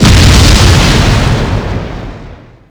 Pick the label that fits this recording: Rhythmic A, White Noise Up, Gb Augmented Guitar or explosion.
explosion